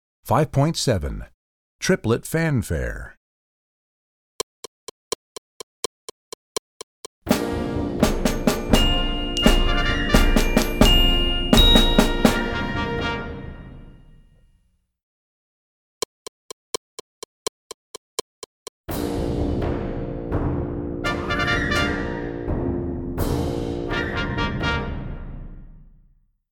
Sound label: Triplet Fanfare